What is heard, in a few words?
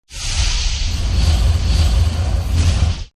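Ship Weapon Beam Disintegration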